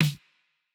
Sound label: snare; Reference Beat BPM120; j city pop 1980